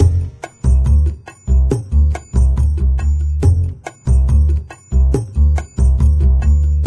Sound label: Italian Music; Diner Dash 2 Restaurant Rescue